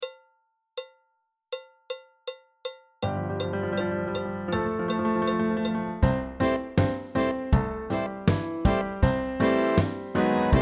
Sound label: Backing Track; Comin Round the Mountain; She'll Be Coming Round the Mountain; folk song